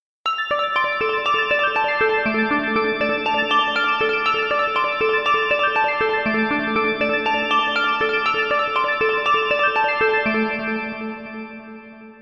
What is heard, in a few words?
Ringtones; Samsung Galaxy Pro 12.2 3G